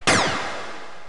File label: attack; FingerGuessingMaster